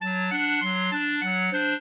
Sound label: clarinet; Mozart